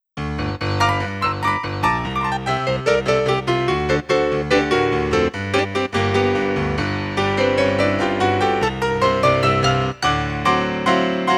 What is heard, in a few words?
dance piano